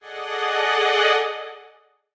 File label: Violin Accent; Samantha Swift and the Golden Touch